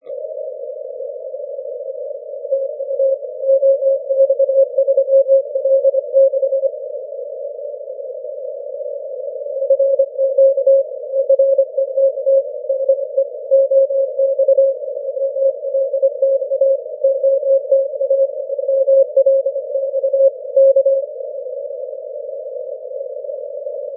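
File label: 6m continuous wave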